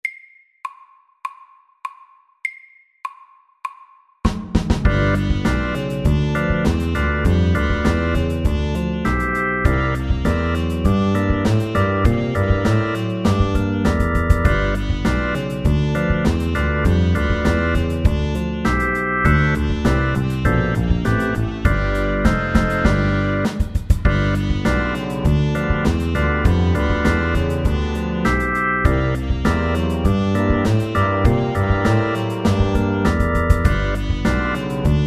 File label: 8 bar blues